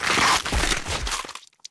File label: bite